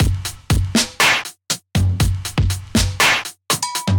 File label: Kit, Drum Kits Standard; Klyde Kit; Ableton; Factory Packs; Drum Essentials